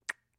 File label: Soft Kisses; Soft Light Kisses. - Fleshy Kisses